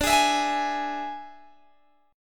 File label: D Suspended 2nd Flat 5th D, Guitar, Open G